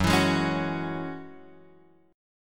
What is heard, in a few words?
Gb 7th Suspended 2nd Gb down Guitar Open F; strummed